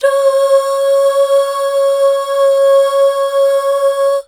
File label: DUUH, BACKGROUND